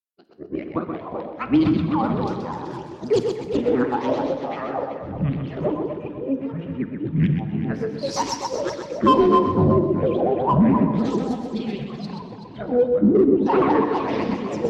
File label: Alien Surreal Voices Atmosphere Bad Dream Flashback Psychedelic; Sci-Fi Voice (Sound Effects:Science Fiction)